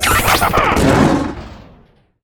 run

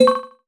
ooc board

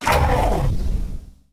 hurt, cylarkan she; combat; creatures; CosmicRage

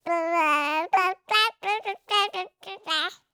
wiggle; CosmicRage; CosmicRageSounds; birthday

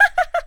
taunt, combat creatures default she; CosmicRageSounds

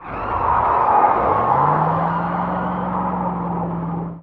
skid in, Cars; CosmicRage

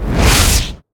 fire; CosmicRage; combat; bigbite

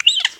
squeak

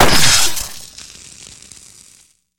stun gun; enemy, combat; CosmicRage; CosmicRageSounds

